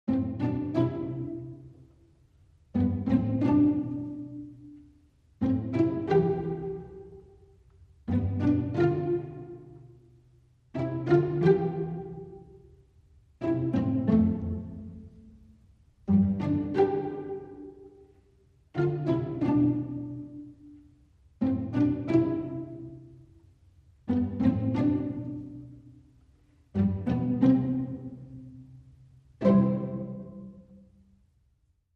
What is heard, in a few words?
Strings Only